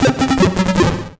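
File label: MUSIC EFFECT Solo Chip Arpeggio Negative; startide macos switch windows gamerip 2017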